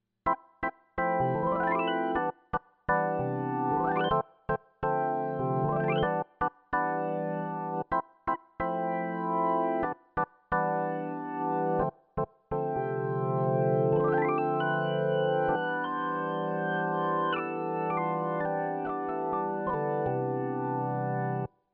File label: Triton Taktile ChordScale und TouchScale